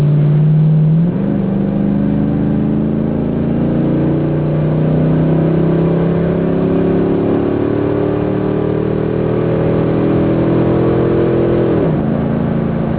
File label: 40 80 interior, Full Throttle Acceleration; Corsa